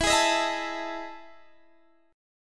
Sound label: E Major 11th E down Guitar Standard; EM11 strummed; JGuitar